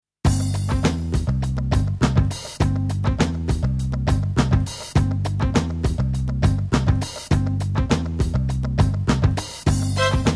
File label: funny funk